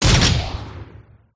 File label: fire, weapons